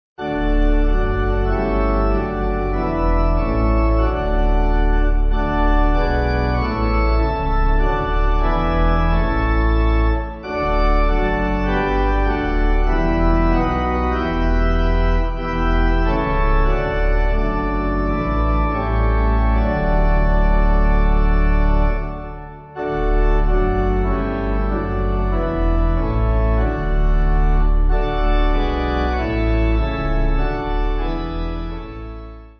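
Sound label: Pipe, hymn backing; Buckland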